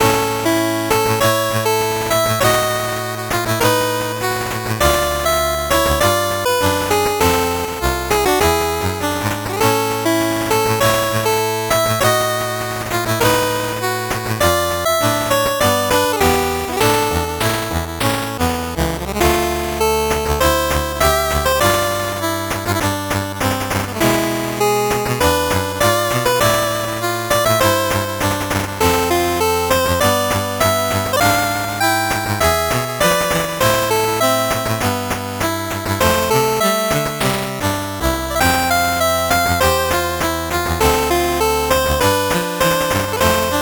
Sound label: 8bit bgm normal